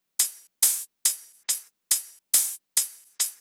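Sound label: drum Loop 140BPM overhead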